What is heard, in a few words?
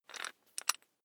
electric drill bit fasten wrench turn fast